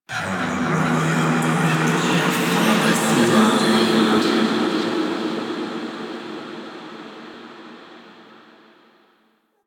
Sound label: cave echoes; Queen; Warframe; Ambience; The War Within; Golden Maw